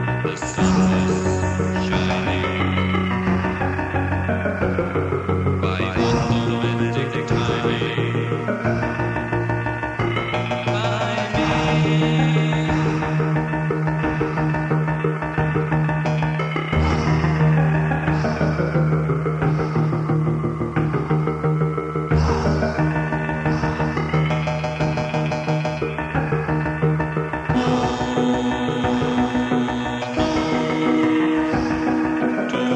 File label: electronic city